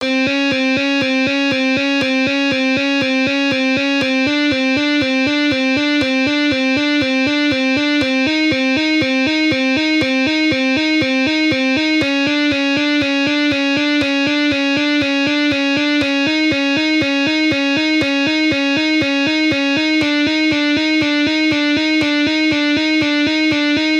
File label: Hammer ons, guitar